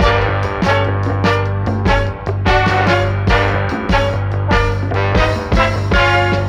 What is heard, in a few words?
horns, Loops Phonky